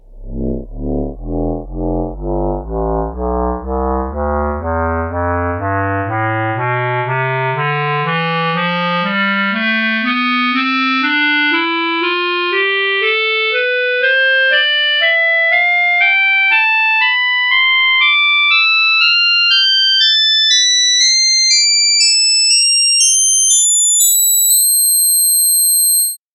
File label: clarinet tonleiter